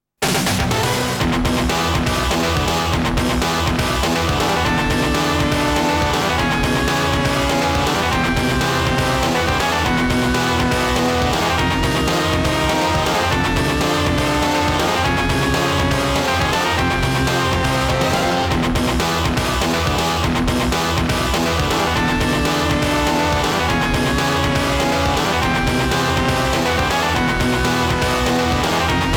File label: BGM